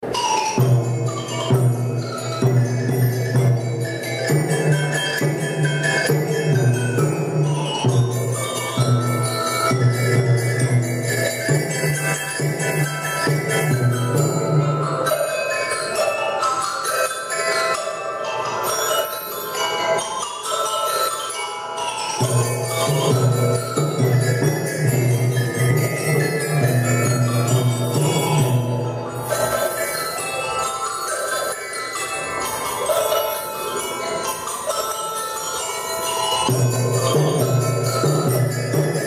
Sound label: percussando